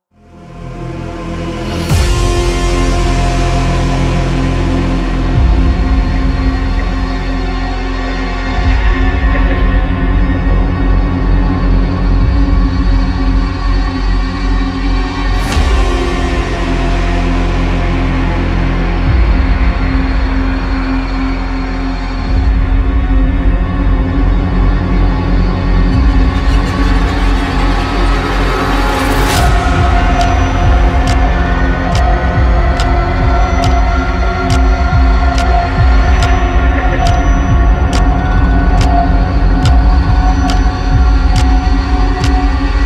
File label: horror suspense background music